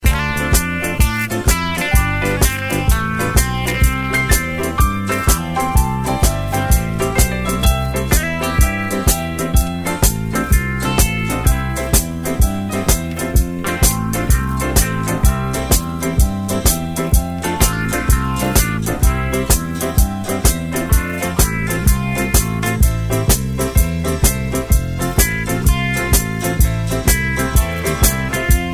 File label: music